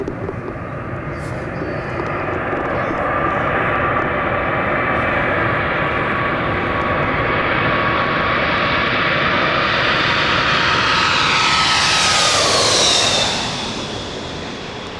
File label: air